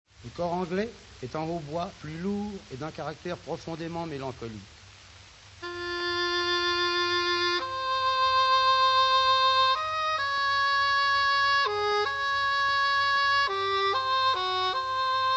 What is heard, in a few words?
cor anglais